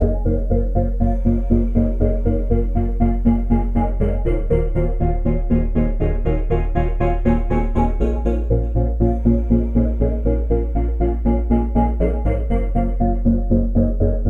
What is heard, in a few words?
synth pluck loop voices Am, 120